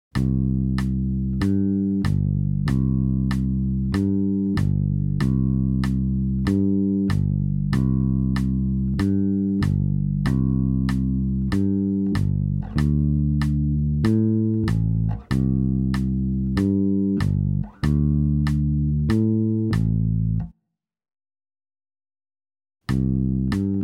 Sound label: Bolero Chacha